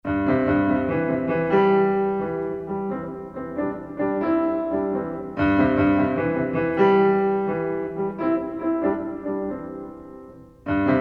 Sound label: Hunting Horns; Marlais Book 2